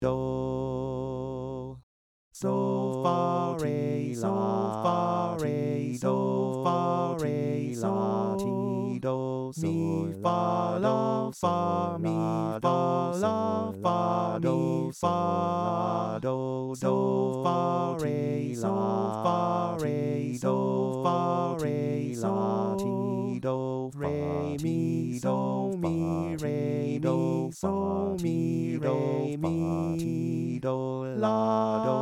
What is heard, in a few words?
Valse; Satie